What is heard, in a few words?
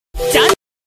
Punjabi Beats Ringtone